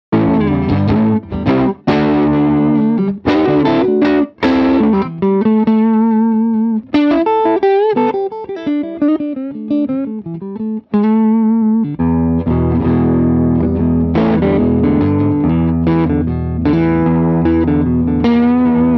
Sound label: spruce neck class a30, vintage telecaster; guitars